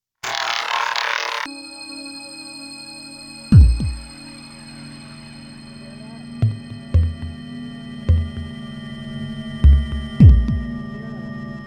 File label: Instrumental